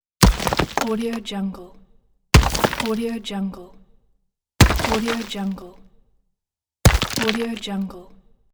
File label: rock impact